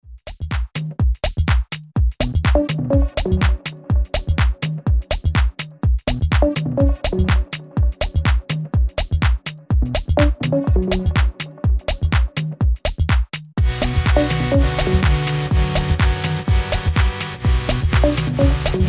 Akai dance

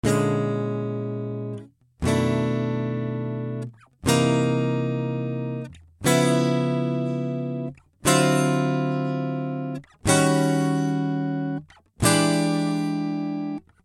GTR UP CHRDS; UAD Apollo

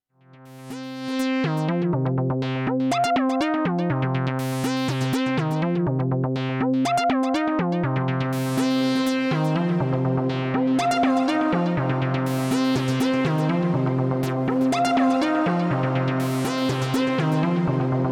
Synthesizer Reverb Shimmer Specular Tempus

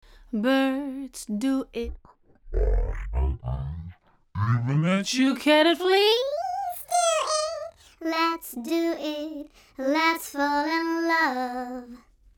Pitch Shifter

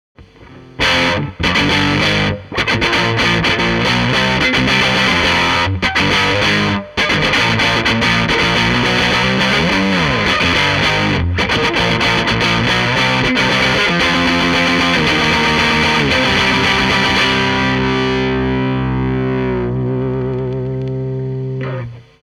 fet 47 Git Amp Dist; united UT

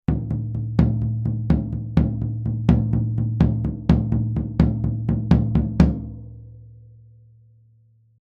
TOM DPA 4099

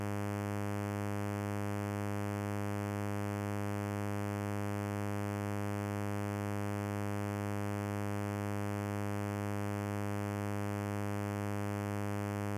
Dubreq Stylophone S2 Sawtooth Subosc

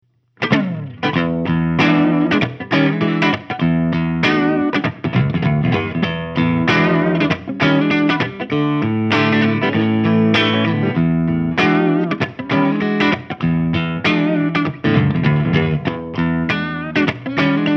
Ibanez AZ24047 Clean

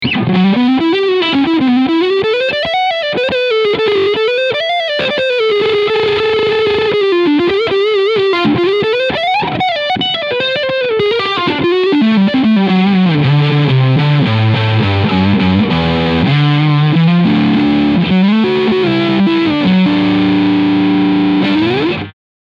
Legacy Leadsound Front Pickup; GL Tribute Black

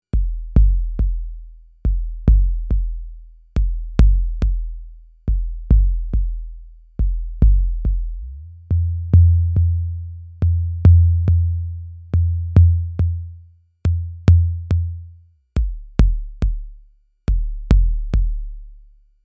Bass

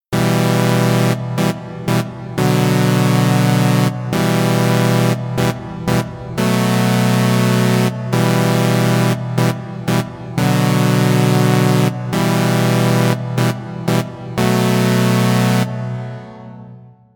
Keys smartreverb Scan

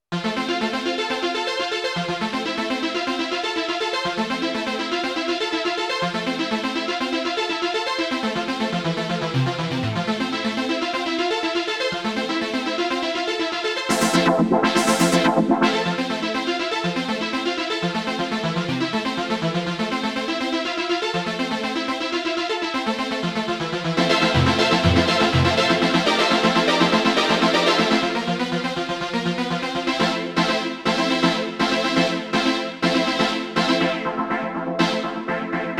Casio CT-S1000V Arpeggiator

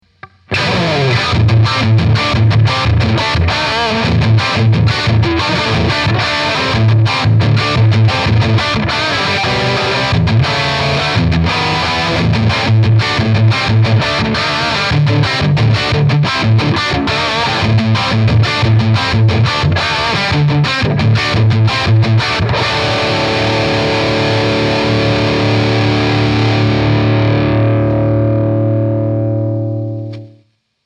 VOX Cambridge 50 Marshall JCM 900 Modeling

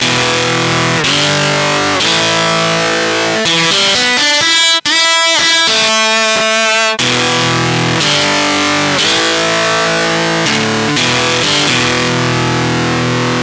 E Guitar BOSS DS1

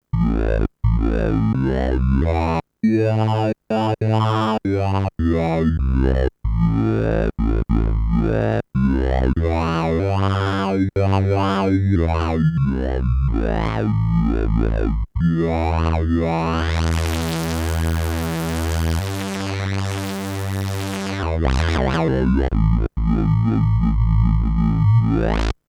minimonsta

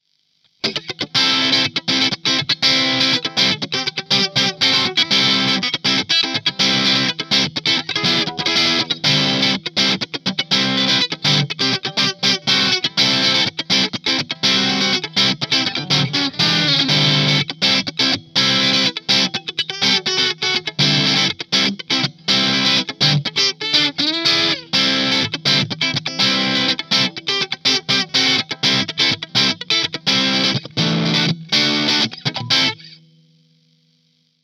Plexi Combo Dirty Funk; iAxe 624

